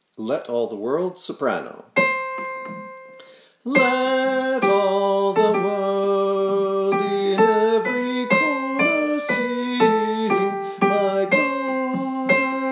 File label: Let All The World Soprano; Whitehead